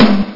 UNITOM

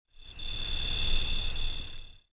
CRICK, nature

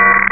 pickup; r3 the art of rocketry

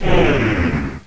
zoomout

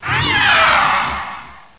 dragon

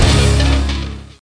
ROCK hit

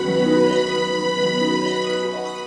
win

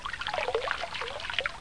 pouring; Funny Face Art Pack

